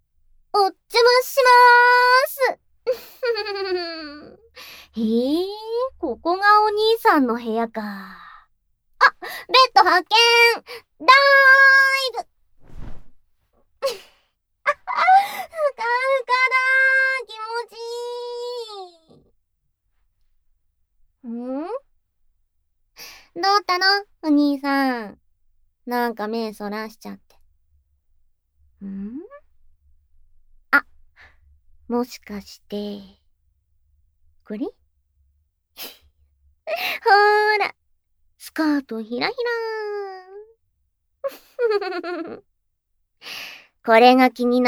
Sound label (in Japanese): 誘惑囁き耳舐め; 生意気ドスケベ家出ギャルJKと子作りえっち！?; イケないJK誘惑囁き耳舐め♪